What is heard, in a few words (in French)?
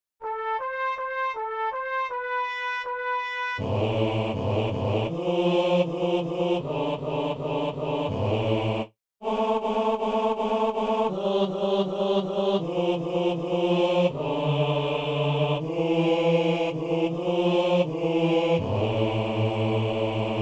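célébrant et basse; Messe de Saint Paul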